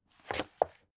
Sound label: book shelve hard